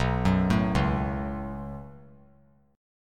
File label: B 7th Suspended 2nd; B7sus2; arpeggiated; JGuitar